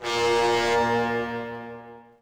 foghorn; Boston

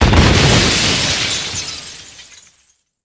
explo display Screen; impacts explos

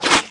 Small Spear Tail, Aliens; Small Spearleg

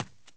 run r, dirt foot; The Elder Scrolls III Morrowind GOTY; XBOX

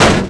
bouncy ac unit large; bouncy objects; Tony Hawk's Project 8; XBOX

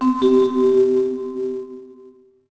new level; Pirates Of The Caribbean

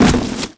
cardboard box hit; bouncyobjects; Tony Hawk's Project 8; XBOX

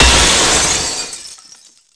hit glass railing; hits; Tony Hawk's Project 8; XBOX

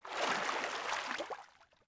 water exit

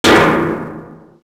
metal hollow hit; projectiles; bullethit; Max Payne

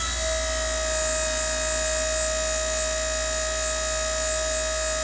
scissor lift up; Tony Hawk's Project 8; XBOX

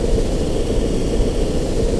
hiss; draco

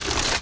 bad point

